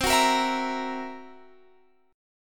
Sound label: Gb 7th Flat 5th C